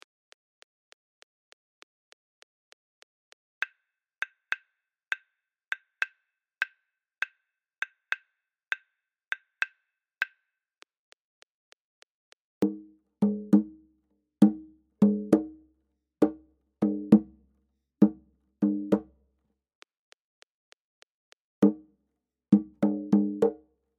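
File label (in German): Mendiani 100bpm, Afrikanische Rhythmen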